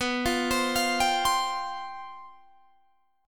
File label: C Major 7th Suspended 4th